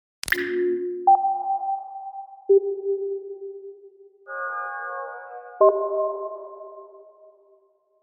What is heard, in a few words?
SFX- (Test Passed); Splatoon 2; Nintendo Switch